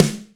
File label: full acoustic snare sample g sharp key; Mono; snare drum samples